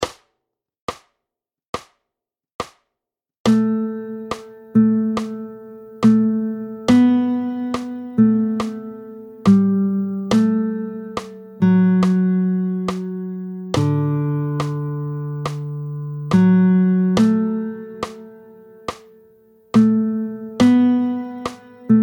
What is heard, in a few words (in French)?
Melodie tempo 70; picking; DO majeur